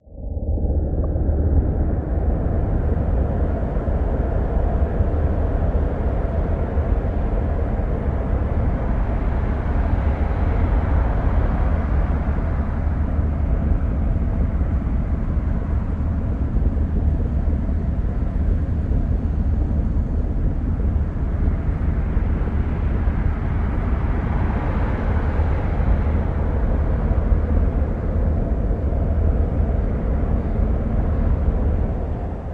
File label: Lab Rumble intense low rumbling with aquatic textures; underwater